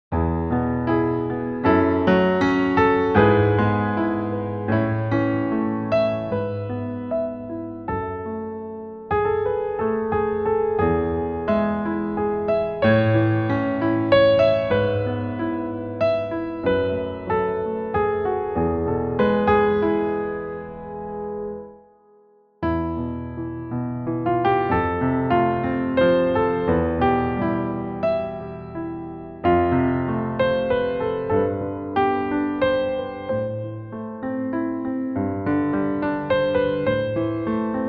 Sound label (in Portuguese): jonio frigio; musicologia